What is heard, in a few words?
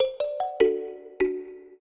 trio, mbira; Mozart